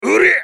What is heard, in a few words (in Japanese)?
攻撃; クラウドボネ